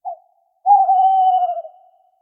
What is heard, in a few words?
Tawny Owl; SyrinScape